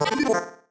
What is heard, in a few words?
marimba dice; ゆめ2っき